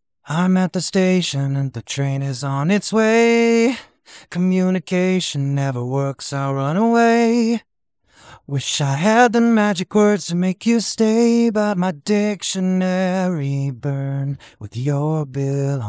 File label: Sample Low Soft Clear